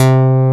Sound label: BASS CLAV, swing synths; Zero-G - Funky Elements